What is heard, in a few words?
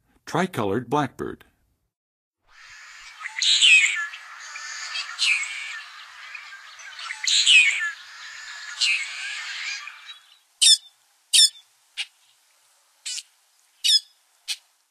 Tricolored Blackbird, Songs of Birds of North America; Western USA; Western USA Songbirds